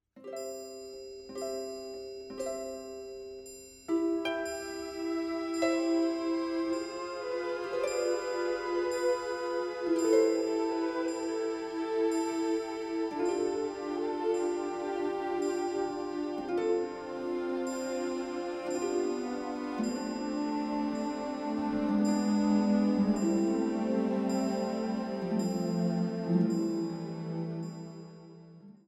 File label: piano; level 2